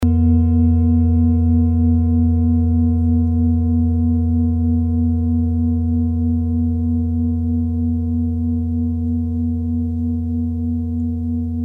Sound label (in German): klangschale nepal